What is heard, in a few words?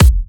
Bassdrum; Vengeance Samples